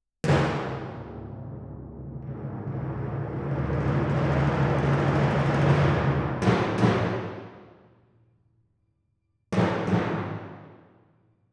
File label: Timp